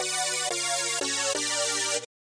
178 stabs; remixable collections

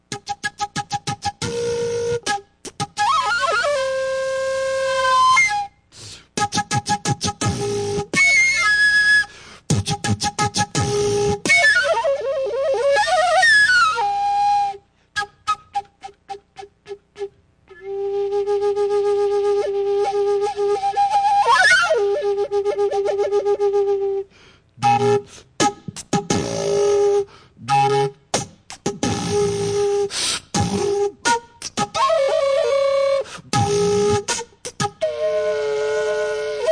song, beatbox flute; remixable collections

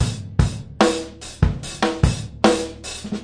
074 steady n hard, drum loops and breaks

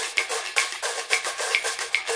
110 smooth samba

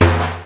arabic perc, Arabic Percussion Kit

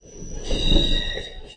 subway sound; Subway Sound Construction Kit

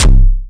Syn Dr, Overloaded Synthetic Drums; Hip Hop; style kits

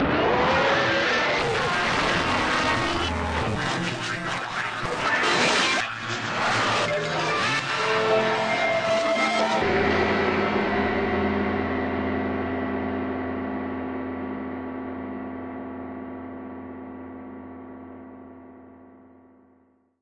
radio tuned chaos experience; Atmospherics

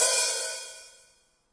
rs7000 hh cy c1, Yamaha RS7000 Cymbals; drum machines

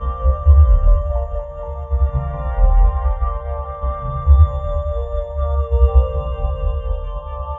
strange pad and pass; Pads

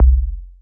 sub tube kick, electro FX; UNUSUAL KITS and FX; Atomhard Electro FX

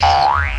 kartoon boing; Weird and Interesting Hits

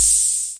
cym, Ace Tone Rhythm Ace FR-1

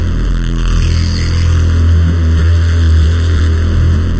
every effect i have, bass loops

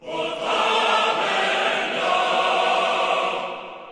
intense chorus chant, MALE SINGING; VOCALS and SPOKEN WORD

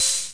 wersi shak, Wersimat 24; drum machines

